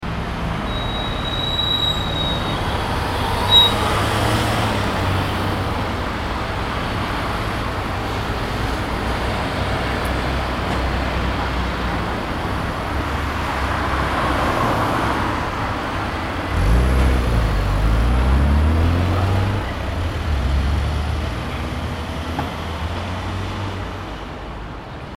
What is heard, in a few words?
surround